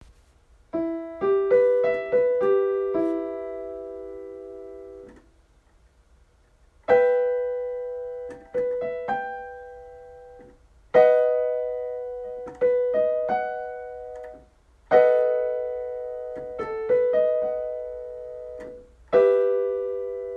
Аккорды ми минор

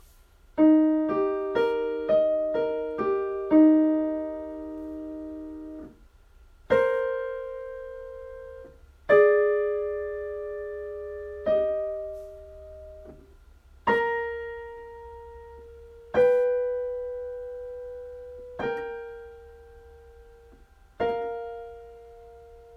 Интервалы

